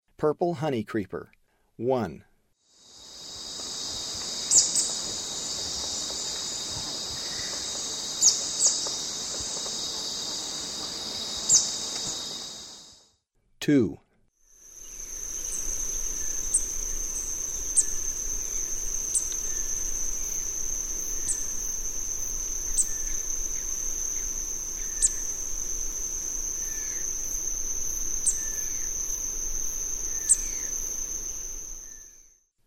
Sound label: Purple Honeycreeper; S. America; Eastern Lowlands